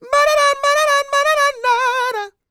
SING, Vocal Planet R&B & Dance; ILIO

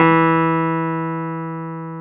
KEY X Hohner Clavinet

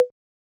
Yamaha DJX 2B, T8 Conga

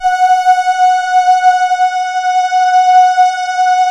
CRUMAR; Masterbits; Vintage Voltage

